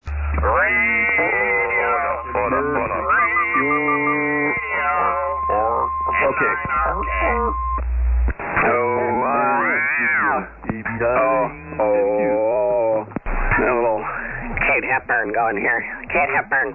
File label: SSB Net Tune Up